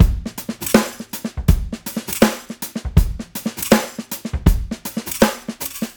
SIX POLY, CLEAN KIT; Tuna Beats

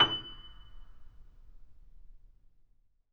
Mp F#6 off, Grand Piano off mic; AKAI S6000 CD-ROM - Volume 2

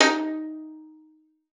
D#4, VIOLIN PIZZ; Xsample VOL-5

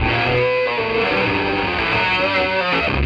Electric Guitar; Sonic Foundry (Sony Creative Software) - Friendly Fire; Serpent

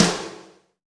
Dance Snare; Snares

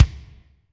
Kik Spike Rm, Drum Machines Alesis D4

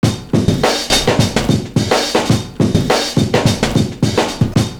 GARAGE SOUND, LOOP; Univers Sons

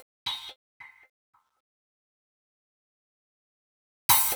Dreamy Open Closed Hat 110; Percussion Elements

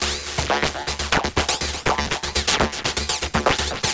Drum Fx Loop, Electronica; Sonic Foundry (Sony Creative Software) - Electronic Point Blank

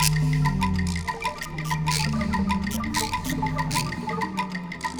Percussion Combos; M-Audio; Pro Sessions VOL-38; Abstract World Fusion 2

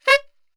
C#3 SAX SHOT

